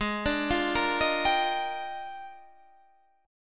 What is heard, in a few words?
A sharp 13th